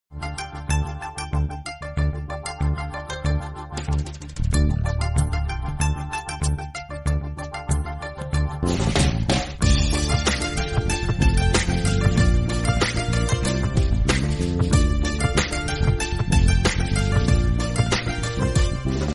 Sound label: love bgm ringtone; anandam